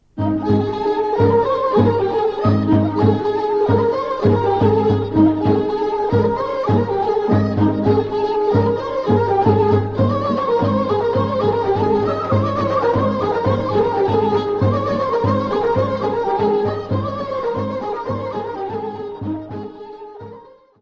Grancarsko Horo, Bulgarie

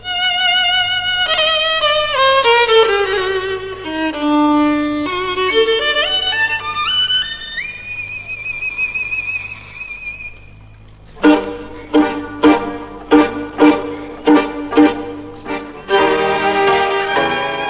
Hora - Roumanie

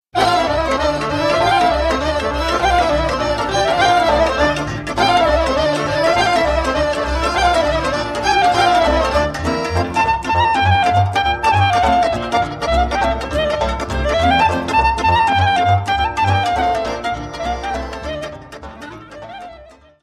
Sirba - Roumanie

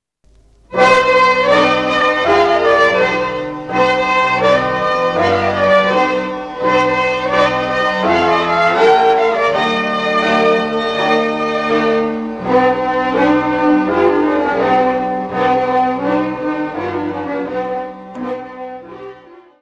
Roumanie

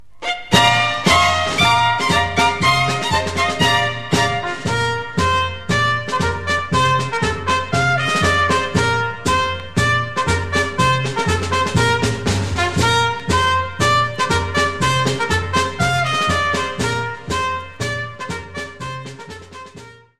Klepperwalz, Belgique

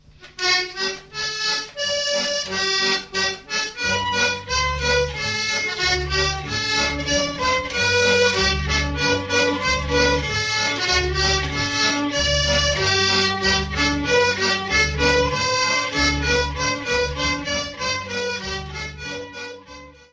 Polka, Belgique

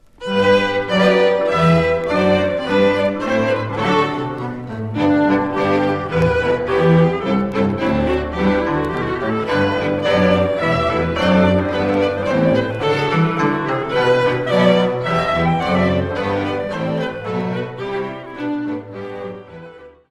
Hongrie

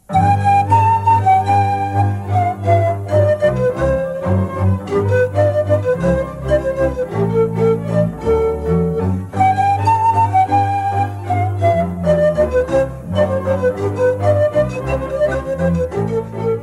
Briul banatean - Roumanie

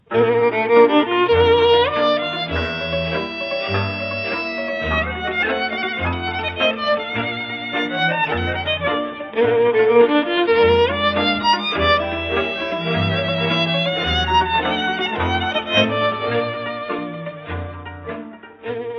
Sirba - Roumanie; Sirba de Ascultare

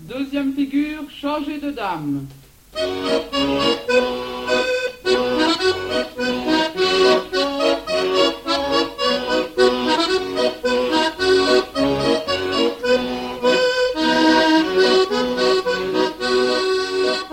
Quadrille, Belgique

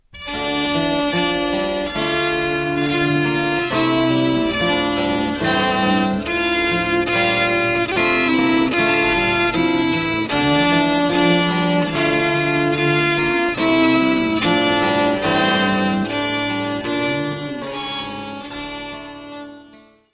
Branle, France

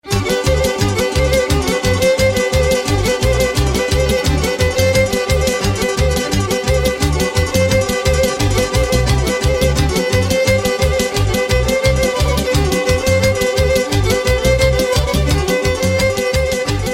Kolo - Serbie; Vlasko Kolo

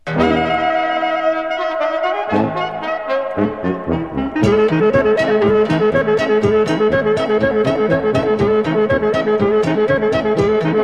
Maruntica de la Cajvana, Roumanie

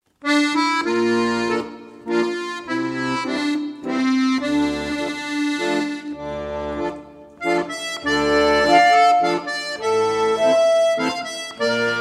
Mazurka - Belgique

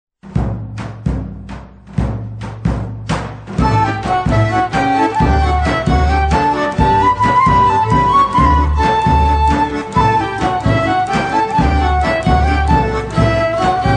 Oro, Macedoine

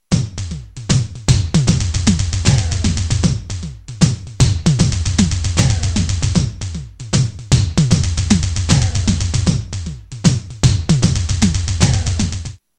batterie electronique; instruments

